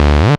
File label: Offbeat Bass D; Vengeance Samples